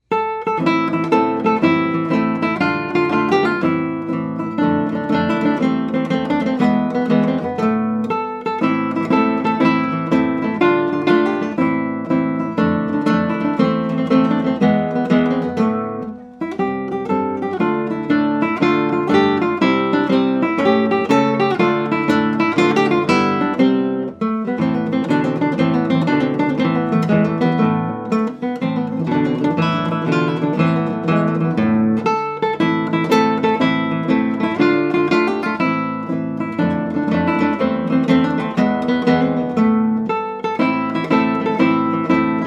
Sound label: Tarantella, guitar